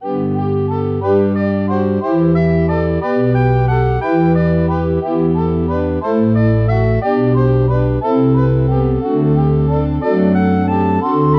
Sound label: recorder